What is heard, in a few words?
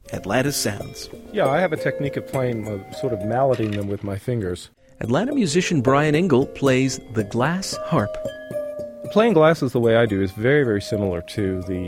Glass Harp